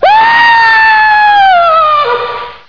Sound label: scream